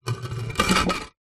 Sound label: household plant pot heavy stone move slide concrete; zapsplat